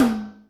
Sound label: Ind Tom H, Yamaha DTXpress Electric Tom; drum machine